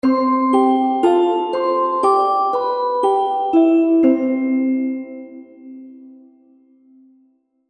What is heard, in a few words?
Happy Baby Game Music; Cartoon Music Sound Effects